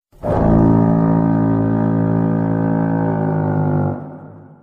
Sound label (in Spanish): barco; dross